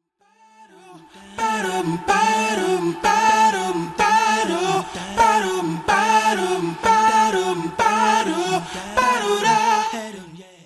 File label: Acapella